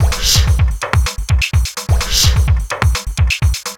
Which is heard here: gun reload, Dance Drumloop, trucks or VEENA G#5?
Dance Drumloop